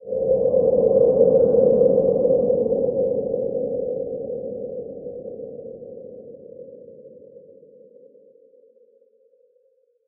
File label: wind element scary ghostly